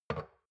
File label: shot glass place table soft; berufe; gastronomie; soundpool